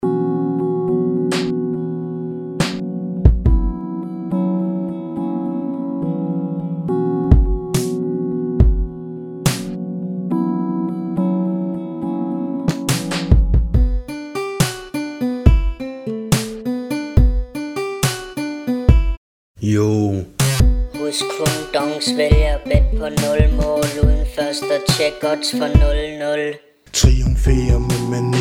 slow swing

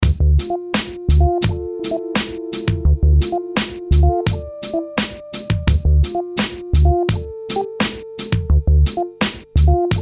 pizza beat

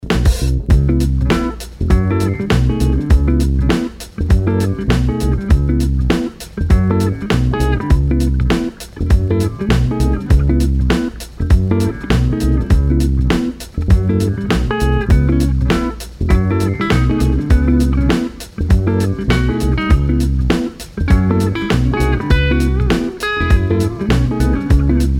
Generic Funk

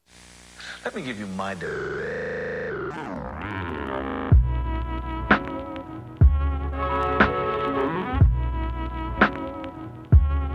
jazz

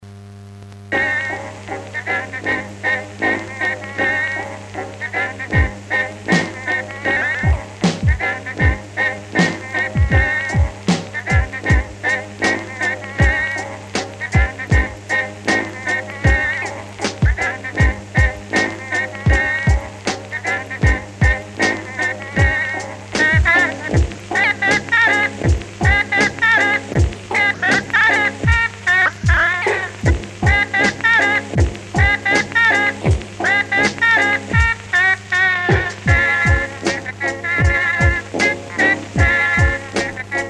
tomtomtom

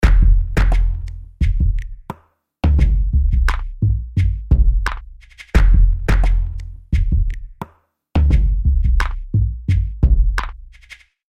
Beat Stuff

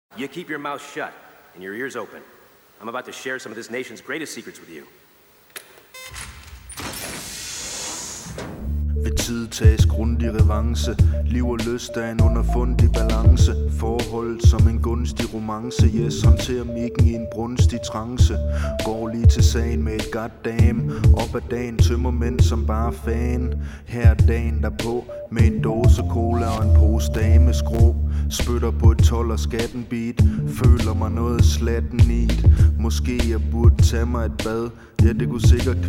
A beat Vox

